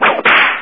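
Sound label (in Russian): мультяшный звук удара плеткой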